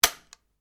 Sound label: RIFLE ANSCHUTZ 67MSR 22 CALIBRE DRY FIRE; A selection of domesttic firearms; Rifles; Guns